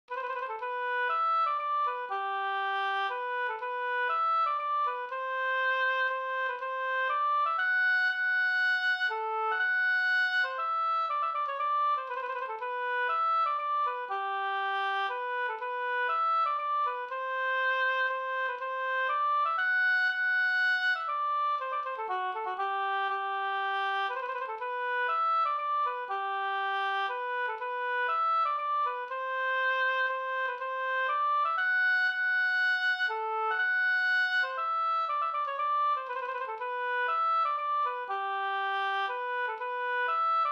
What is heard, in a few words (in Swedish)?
polska Bodapolska